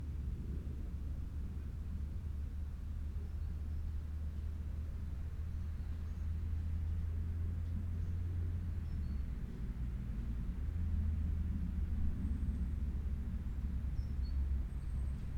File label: City Ambience Eerie Noise